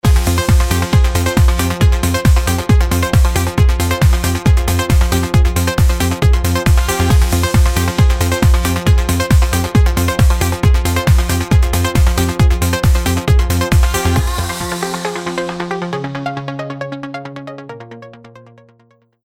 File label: agressive